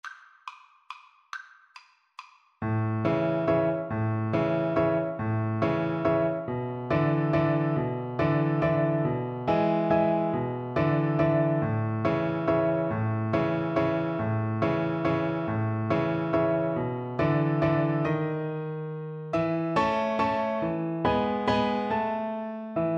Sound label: strauss fledermaus viola